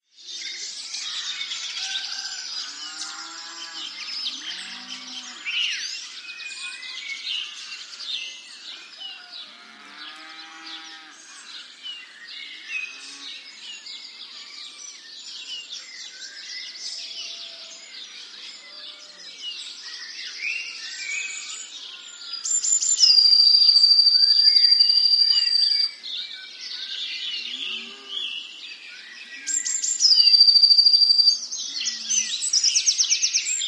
ANIMALS-BIRD BGS RURAL ENGLAND: April dawn,, many song birds, intermittent close & shrill bird song, distant cow bellows, Wiltshire; Brixton Deverill